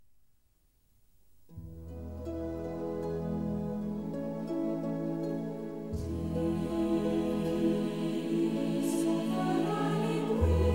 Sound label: A Gaelic Blessing